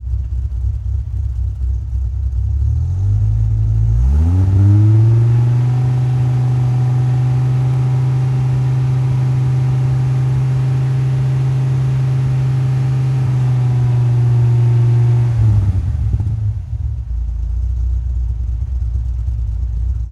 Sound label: Viper GTS Fast Idle; Automobiles